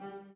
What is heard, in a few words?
b piano